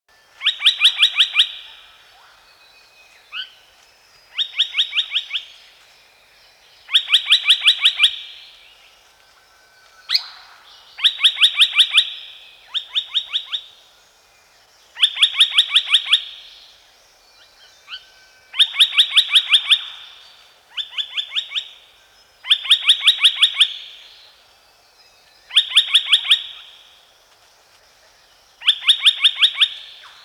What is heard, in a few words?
Australian logrunner